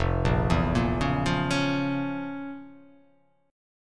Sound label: F Major 7th Suspended 4th Sharp 5th; 1,1,2,3,1,0,3,x; down; chord shape